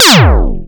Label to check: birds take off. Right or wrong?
Wrong